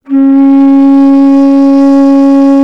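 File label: bass bansuri, World Winds; E-MU Producer Series Vol. 7 – Old World Instruments; World Combos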